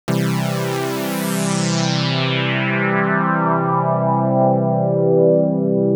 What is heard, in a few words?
modular c2 e2 g2 filters; Moog Modular V C2、G2、E2的双震荡器+共鸣滤波器的锯齿波和声